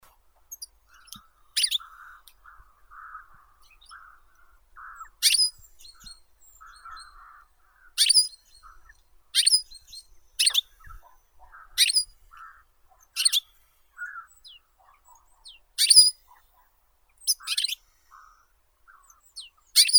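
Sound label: goldfinch, Carduelis carduelis; shegol; zvuki prirodi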